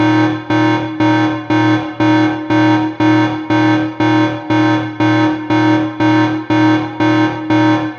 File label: Alarm